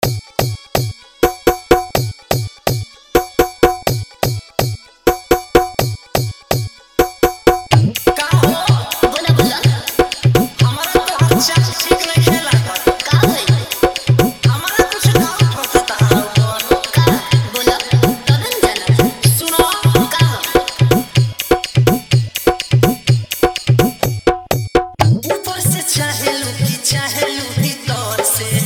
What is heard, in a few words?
New Bhojpuri Birha Style Dj Remix Song